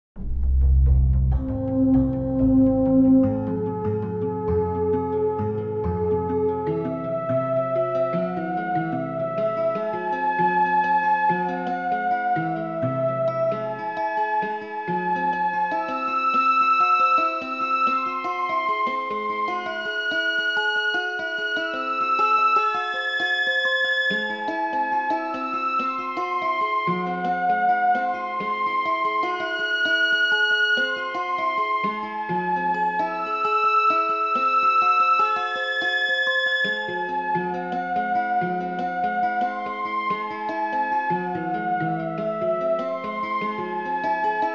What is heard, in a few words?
beautiful 13 limit harmonic series melody